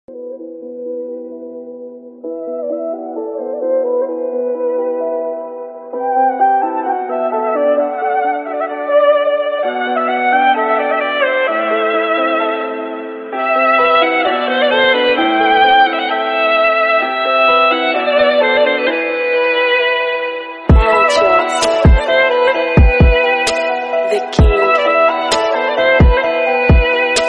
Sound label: sad music turkish oriental emotional